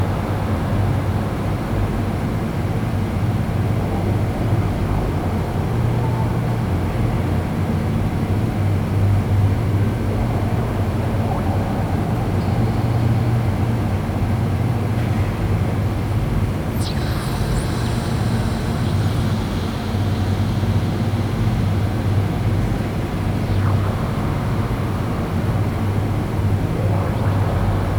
Diorama Landscape Loop; Sol; Warframe; Duviri; Dioramas

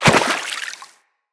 Operator Walk Water, Footsteps

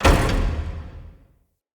Vent Close; Encounters; Ode Pollution Tower

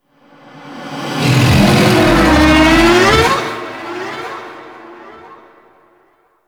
Vocal Patrol; Enemies; Vocals; Warframe; Teralyst

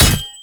Ghoul Devourer Melee Impact

